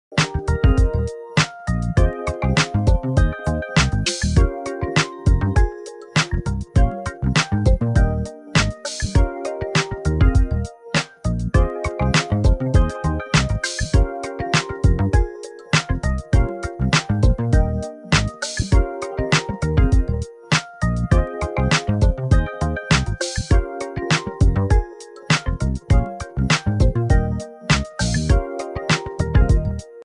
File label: beat radiance